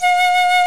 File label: PICL; Roland - LA Composer vol. 1; WOODWINDS